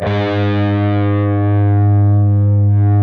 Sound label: PRS lead